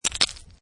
Snow And Ice Bits Of Ice Falling On Ice; Sound Effects:Weather

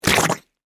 Gurgle Mud, Texture, Gurgle, Mud; Water; Nature; BlastWave

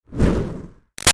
Fire Torch Whoosh Med Burst Lite; Flames; Objects; Big Room Sound

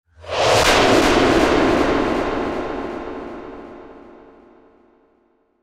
Whoosh To Hit Delay; Blastwave

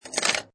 Pinball Sound Ball Rattling Objects; Video Games; Lynne Music

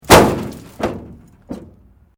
Metal Light Movement Minor; Materials

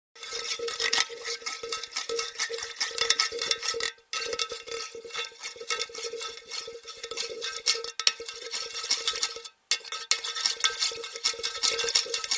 Stirring Pot, Stirring food in a pot.; Household

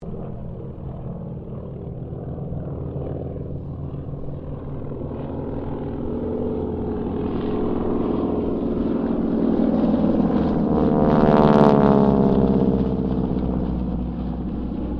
AIRCRAFT CT4 WINJEEL WIRRAWAY HARVARD PASS; Mixed aircraft squadrons; Airplanes

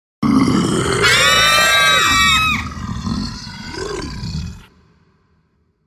Monster Growl, Monster Growl with female screams.; Monsters; Horror

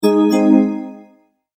multimedia tone alert; Tones; Sound Design

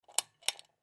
Switch Pull Chain, Switch Pull Chain Light Fan; Switches; Foley

